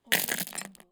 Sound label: large iron chain drop on concrete